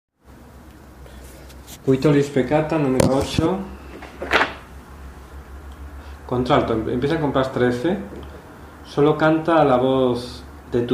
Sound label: alto; qui tollis